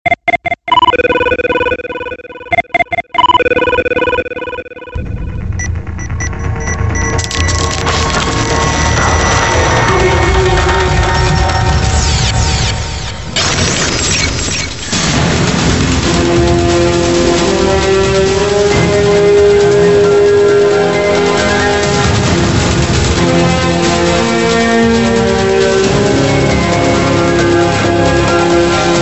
Sweet Tone

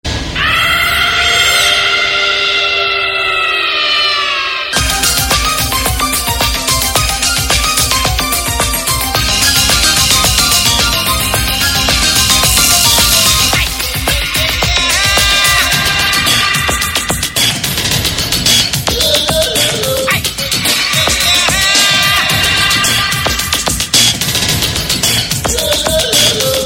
Scary; Gupt

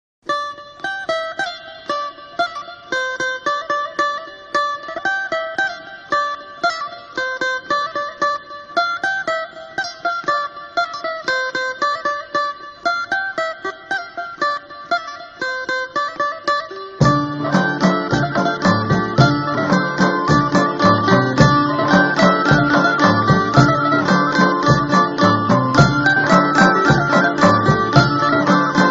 Shqip Ciftelia

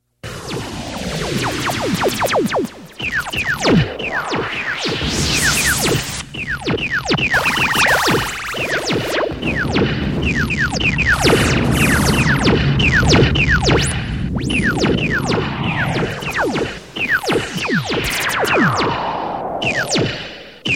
3d Laser Attack

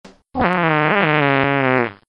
Fart tone

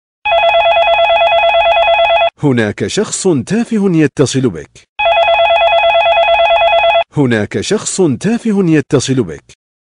honk

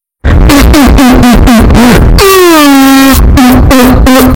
Smack; Jeffy

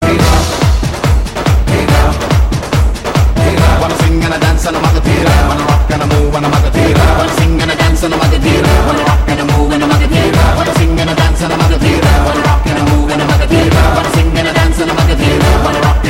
Rap; Magadheera

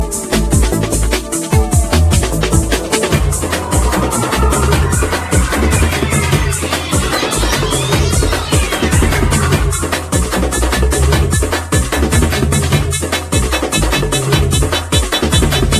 Theme Music

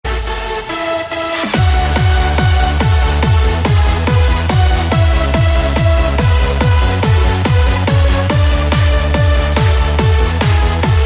Postman Sms

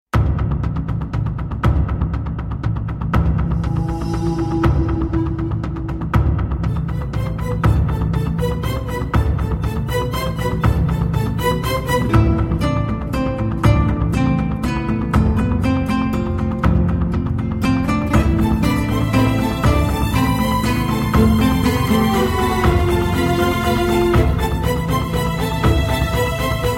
Flute Music; Maayavi

